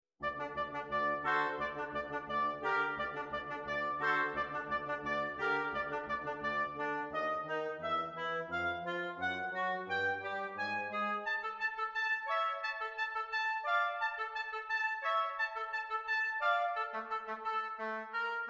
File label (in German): Quartett in g mit flauto oboe fagott